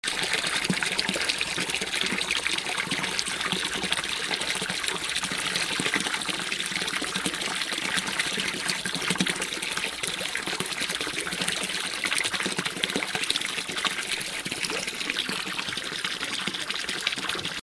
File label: water